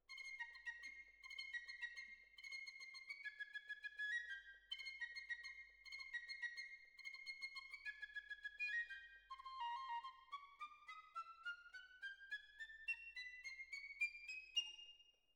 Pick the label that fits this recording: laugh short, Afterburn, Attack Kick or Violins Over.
Violins Over